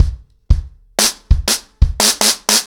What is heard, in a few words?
90BPM, acoustic drums, drumloops